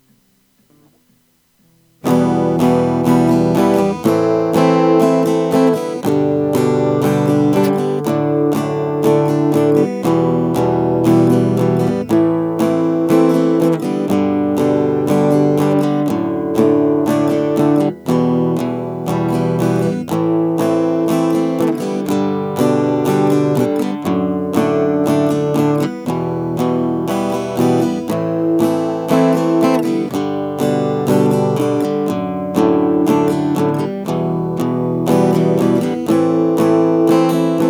main riff

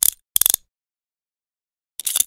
cuff

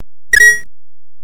start song

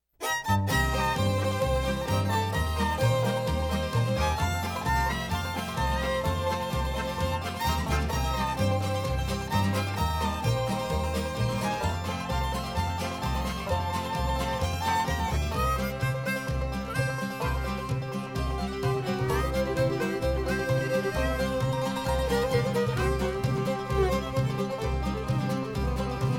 acoustic instrumental

